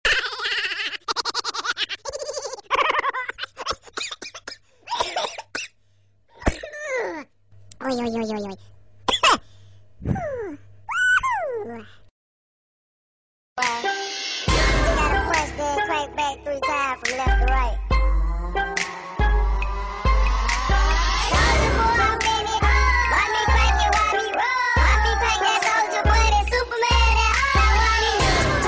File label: weird ringtone